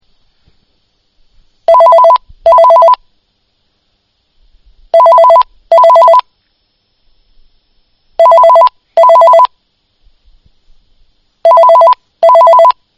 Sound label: Ring Tone